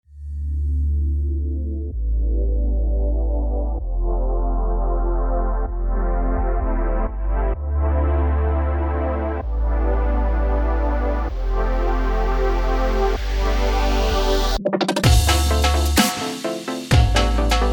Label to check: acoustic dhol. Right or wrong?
Right